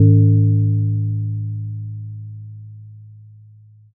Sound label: gong